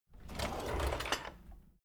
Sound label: drawer kitchen open loud